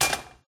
Pot Hit; hello neighbor pre alpha alpha 1; windows

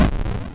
EXPLODE; Diamond Hollow 2

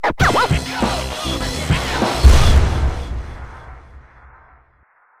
Success Knockdown; True Crime New York City; Xbox; Inter Success Knockdown

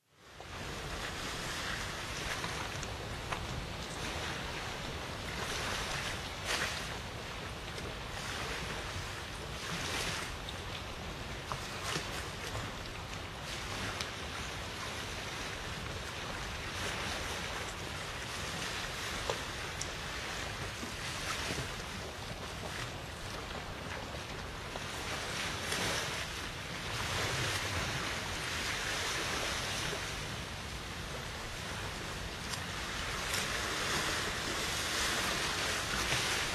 Ambient Water Lapping; silent hill homecoming 2008 gamerip pc ps3 x360

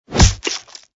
sound hit body; Monster Arena

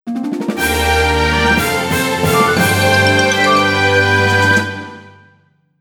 Victory!; Luminous Arc

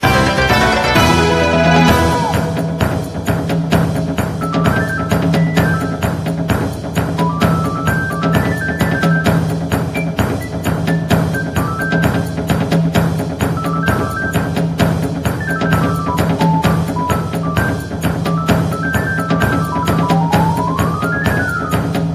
Jingle Win

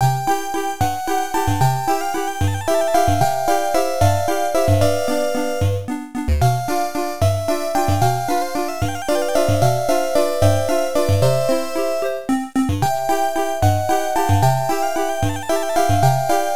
Waltz; galaga 88 tg 16

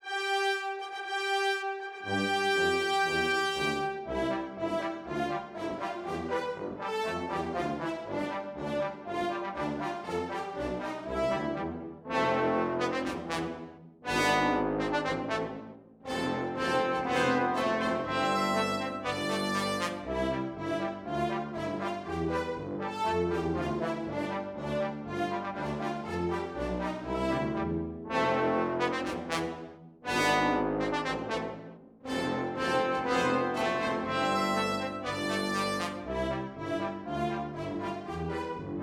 BGM Mission Demo Rocket Start

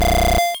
Ice Beam; Pokemon; Gen 1; attack moves; RBY

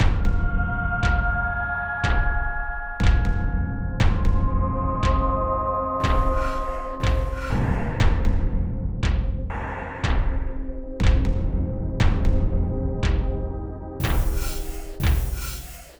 Success Tonal A4

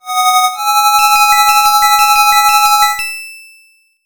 Retro; Game Center CX Arino no Chousenjou 2; Nintendo DS

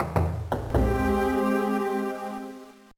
Failed, gba

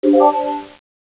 Second combo; quadrapop mobile gamerip 2005

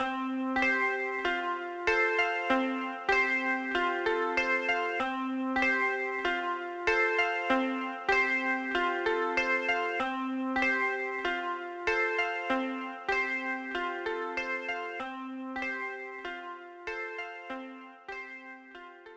General Main Melody; Paws & Claws Pampered Pets; nds

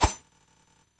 Slash; super minipix; android

